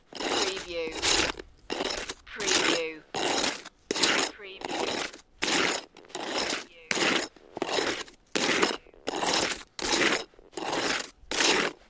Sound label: Snow and Ice, Many ice slides